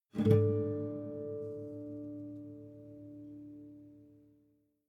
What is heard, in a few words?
Savannah Music